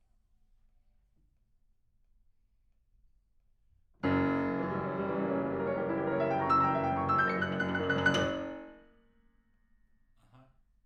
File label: PIANO